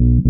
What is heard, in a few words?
DUB BASS